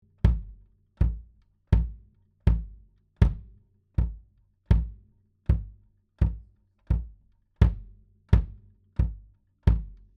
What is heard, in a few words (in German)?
neumann u 47 fet bassdrum